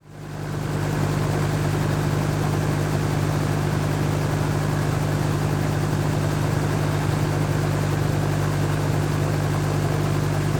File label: Vehicle Helicopter Idle Shut Off Wind Down; transportation